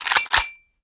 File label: reload empty xmag charge; gmod